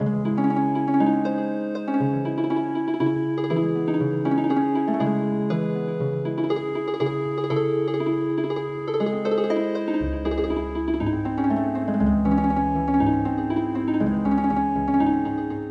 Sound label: Healing Harp